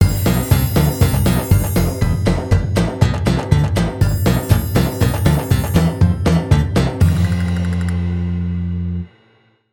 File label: samsung estridente, ringtone